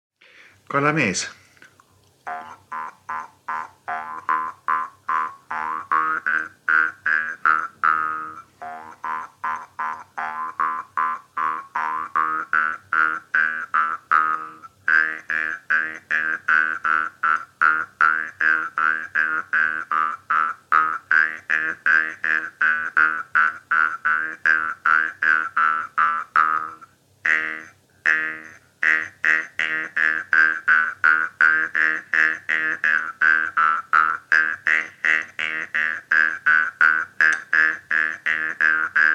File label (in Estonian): parmupill folk; Viru-Nigula; Kalamees